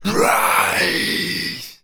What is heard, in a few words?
zm scream; cstrike; biohazard